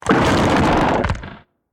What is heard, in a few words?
death, Hammerhead; Hammerhead gold